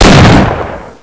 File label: sbarrel, weapons; Half Life Model Pack; Half Life 1; valve hd